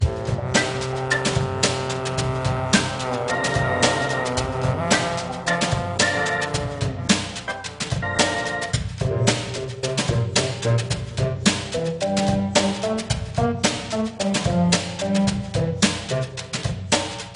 Jungle Level

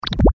Plop Bulb

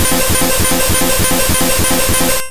Solar Beam; Pokemon; Gen 1; attack moves; RBY

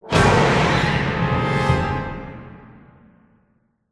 Music Stingers - Battle Stinger

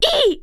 Female Hurt Grunt

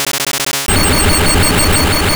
Zap Cannon; sfx; attack moves; pokemon; gen 2; gsc

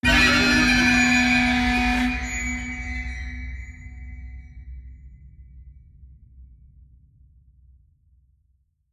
Shock; Black Mirror 2 Reigning Evil

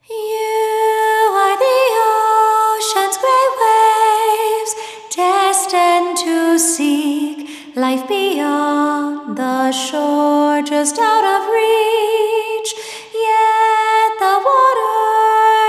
Vocals; fire emblem fates if english

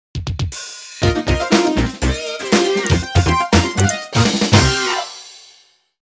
Main Bowling Tune; PSX

